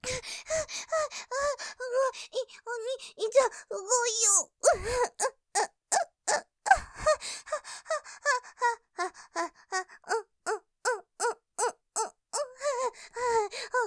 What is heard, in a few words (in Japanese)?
喘ぎ ピストン喘ぎ, ASMR; ピストン喘ぎで感じちゃう・すごいよぉ; モノラル; ループで楽しむ！シェフの気まぐれ抜き抜きコース